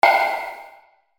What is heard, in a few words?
soda pop